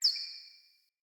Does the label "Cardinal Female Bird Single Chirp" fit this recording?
Yes